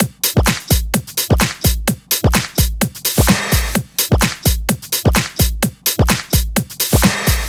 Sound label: 128BPM Dogde Kit